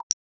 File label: a short soft click, button press